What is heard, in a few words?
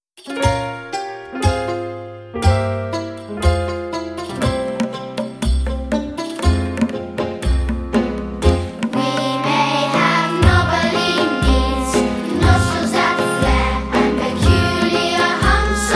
We Are Camels, vocals; children